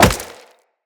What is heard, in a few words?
Wall Jump Rock; Footsteps; Wall Run; Characters; Tenno